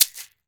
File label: Household Shaker, household rhythm samples Hits